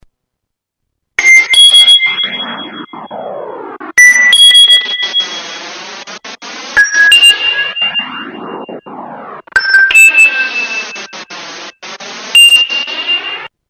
ringtone, electronic

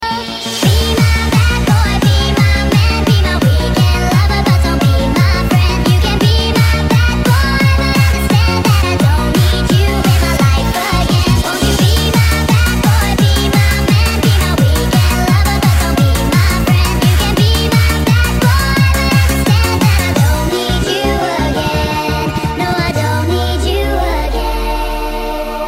Disco

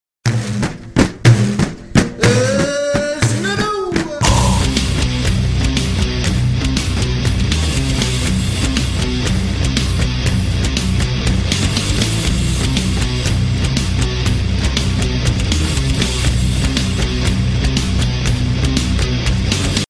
tunisian Metal

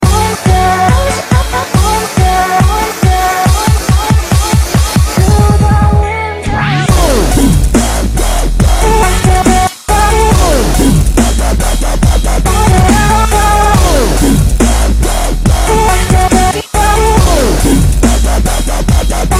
monster dubstep, ringtones

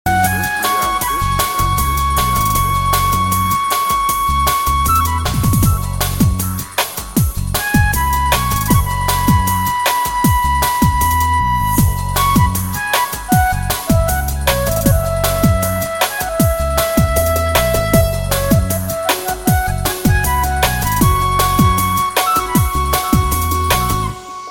romantic flute mix

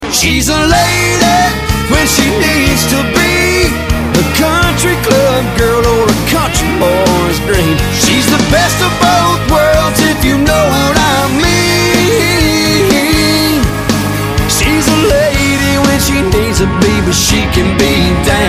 dirty kinda country

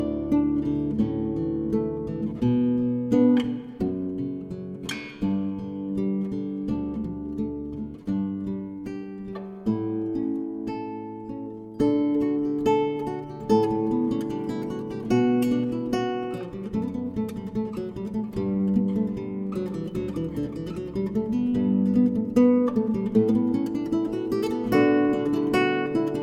rock acoustic

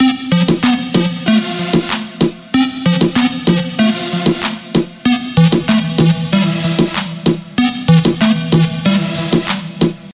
reggaeton

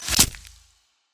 arrow impact; skyrim

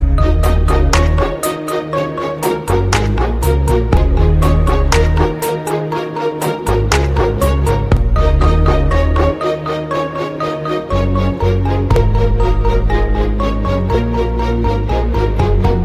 inst, ringtones; blues

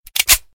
Reload

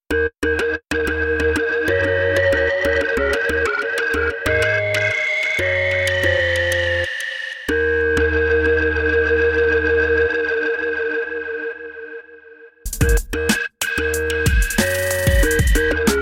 hip hop

